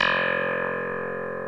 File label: Electric Pianos